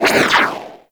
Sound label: ring bounce; Universe Ring; Warframe; Nezha